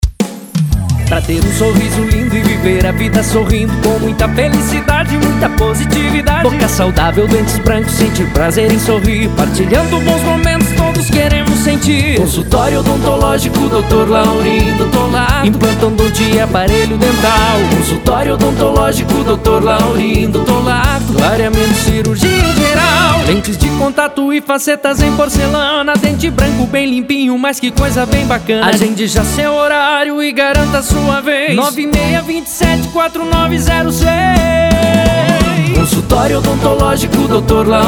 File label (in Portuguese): Jingle; Dr Laurindo